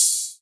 Rides, Cymbals

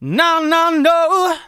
vocals sung F#; Techno Trance Essentials

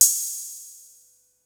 HAT, Hip Hop

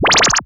buggy, Anime Sound FX

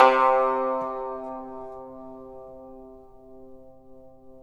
YANGCHIN, C2; INIS - Opium

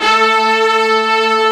MIXBRS SEC A3, MIX.BRS; AKAI S-Series CD-ROM Sound Library VOL-2

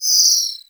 JETSON CAR; Distored Reality

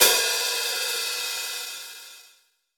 Pop Open HiHat

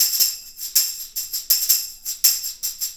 Tambourine

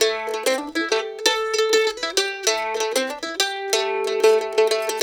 Blues Guitar Samples, lick

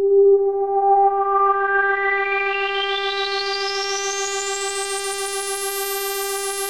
Prophet 5 G4; Hollywood Edge - Giorgio Moroder Rare Synthesizer Collection

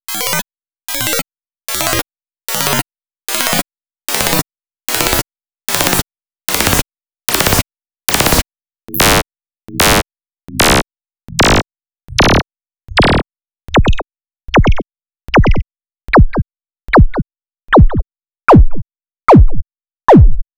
Lazers Artifacts

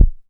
Electro-Harmonix DRM-16, BD

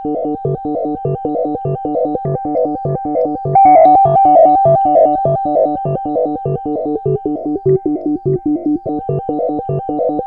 ARPS, ARPS ATMOS; Zero-G Ambient 1

